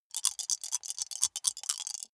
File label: small four rattle in hand closed